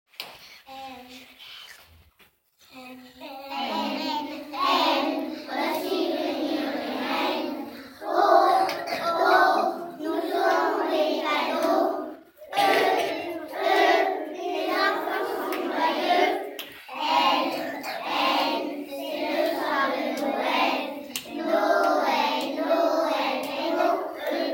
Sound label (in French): canon noel